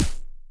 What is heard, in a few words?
fm snare, fm snare drum; sonic3